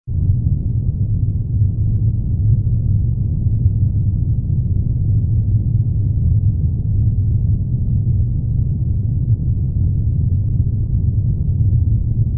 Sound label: underwater subsonic drone